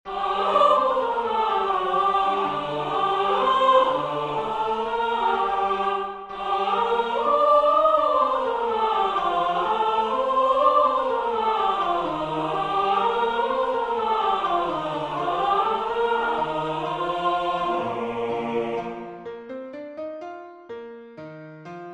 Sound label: Deposuit potentes sop